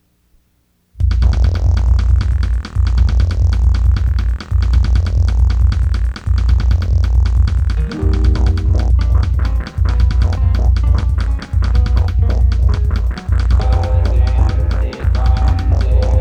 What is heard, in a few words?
instrumental ver